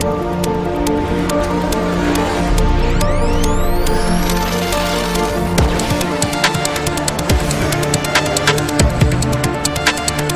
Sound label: remix; tron nasledie; tron legacy